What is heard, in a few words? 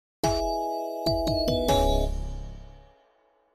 drum ambiance